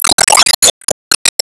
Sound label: Sp Heart Meteor Fall; Sp Swingpower Hit